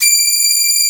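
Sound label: strings section D6 right; STRNGS; AKAI S-Series CD-ROM Sound Library VOL-8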